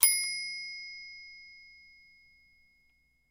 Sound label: Typewriter Margin; typewriters